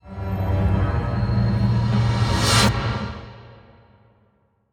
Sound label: Stinger; Bandersmosh